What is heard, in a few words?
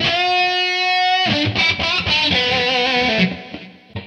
lead 122